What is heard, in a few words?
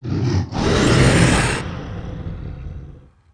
Frostwyrm pissed; 冰霜巨龙（魔兽争霸3）; 亡灵; Wyrm Breath; 冰龙吐息